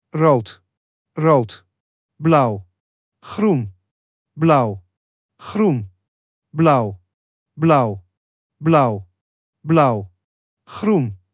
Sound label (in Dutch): Morse gehakt